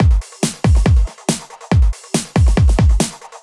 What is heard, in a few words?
Loops BB 140, BreakBeats; Vengeance Samples